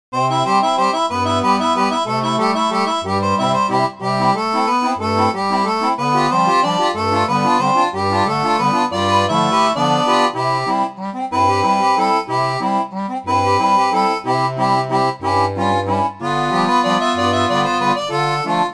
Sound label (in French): seraphone, orgue barbarie